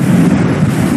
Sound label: Flamethrower Loop; FueraDeEscala; Game Kit Controller